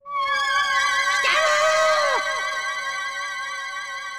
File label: Defeat; gegege no kitarou gyakushuu youma daikessen 2003